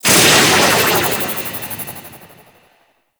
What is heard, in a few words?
Stealth Reverse; Warframe; Vehicles; Archwing Stealth